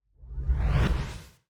Swoosh Transition

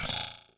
Digital Rattle

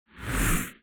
Swoosh Transition

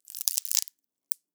Wood Crackle

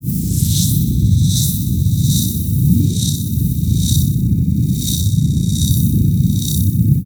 Hissing Rumbling Ambience

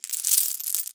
Coins Shake Clinking

